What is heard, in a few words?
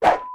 pass, football; bhop